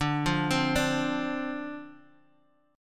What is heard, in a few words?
D-Minor 7th D, chord